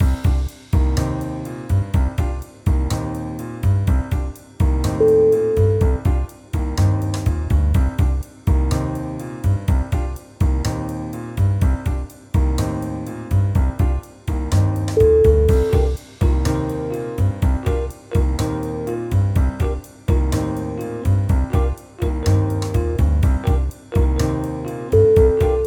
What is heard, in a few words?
feeling jazzy